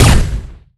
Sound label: energy hit; garrysmod